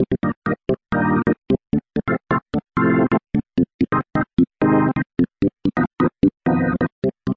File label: 130 church organ Cm Dm Fm G7; KEYS ORGAN PIANO LOOPS